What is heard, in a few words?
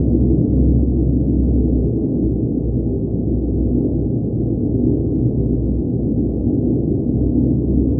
Drone Ambient; Drums & Drones